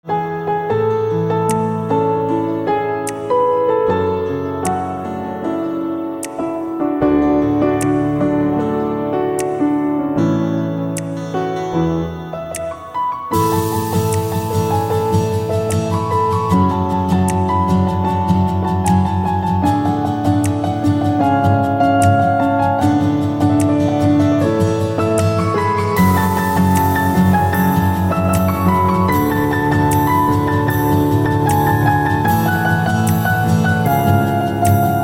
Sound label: Piano